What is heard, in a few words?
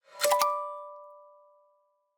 Shuffle